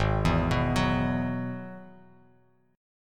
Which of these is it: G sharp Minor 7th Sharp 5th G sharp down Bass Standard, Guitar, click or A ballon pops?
G sharp Minor 7th Sharp 5th G sharp down Bass Standard